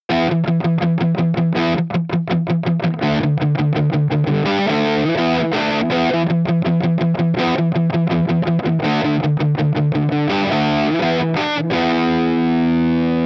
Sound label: crunch